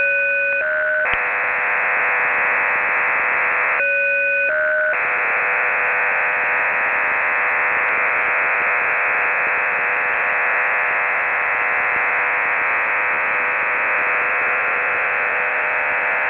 YUG DIP 20, Yugoslav diplomatic 20-tone PSK waveform; YUG-DIPLO 20-tone modem in ISS-mode; Yugoslav diplomatic service; developed by "Institute Mihailo Pupin"; PSK-systems